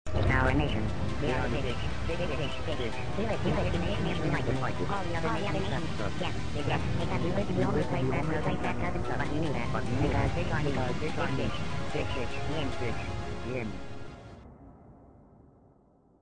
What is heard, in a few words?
electric fish